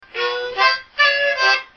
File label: blues harmonica lofi; Harmonica Riffs; WORLD